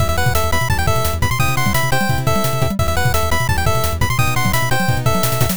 bgm

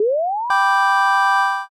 revive

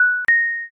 elevator

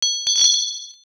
shrine bell

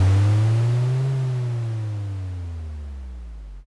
dragon

